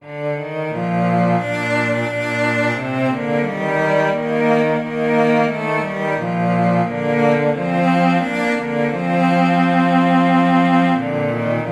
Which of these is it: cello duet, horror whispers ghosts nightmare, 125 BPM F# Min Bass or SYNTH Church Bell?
cello duet